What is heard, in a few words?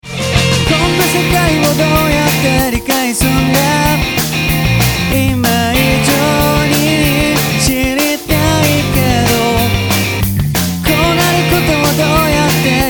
music